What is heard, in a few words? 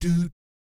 DOOT F3, Vocal Jazz Fx; Spectrasonics; Vocal Planet Vol.3 Jazz Fx